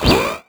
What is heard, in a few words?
Percussive FX; Vengeance Samples; VEH1 Percussion